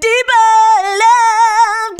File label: FEMALE VOICE; Best Service; Hallelujah